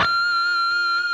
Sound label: PRS F#4, PINCH, Guitars; Spectrasonics; Hans Zimmer Guitars VOL-1